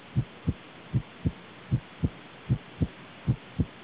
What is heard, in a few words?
normal heart